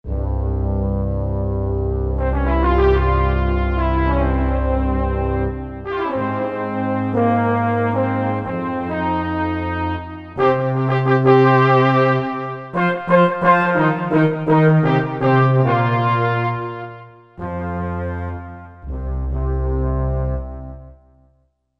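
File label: French Horn & Trombone, Korg MSC-11S Brass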